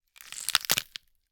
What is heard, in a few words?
gore - flesh and bone crunch wet - hard; Timothy McHugh; Gorification [HD]